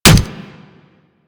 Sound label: Claw Hit; Manic; Attack